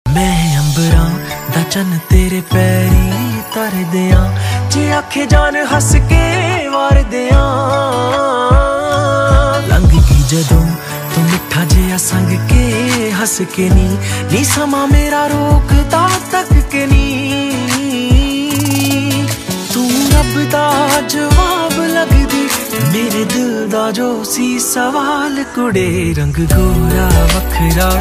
punjabi ringtone

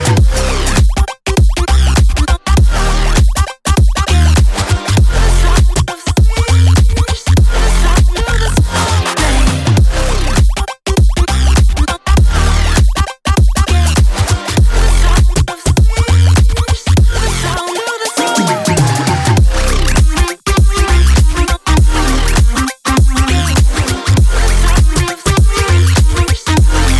iphone ringtone

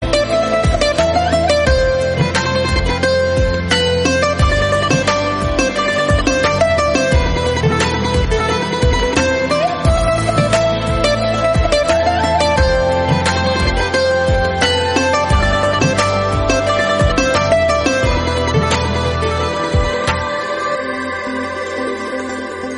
bollywood instrumental ringtone

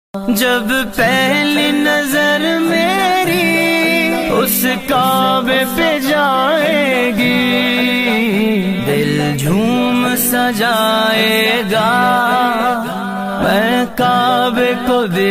naat islamic very beautiful ringtone

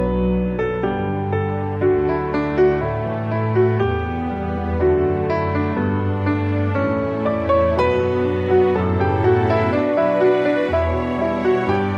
piano ringtone

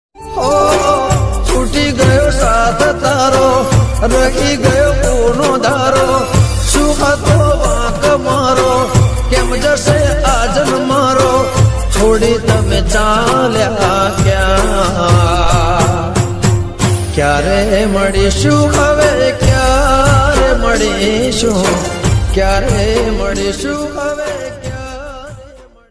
sad gujarati ringtone

